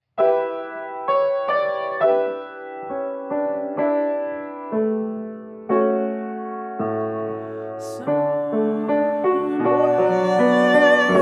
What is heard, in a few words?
Soprano Piano